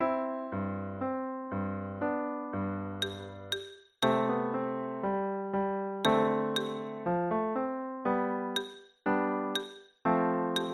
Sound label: pencil